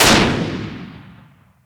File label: gun, gun shots; AKAI S-Series CD-ROM Sound Library VOL-6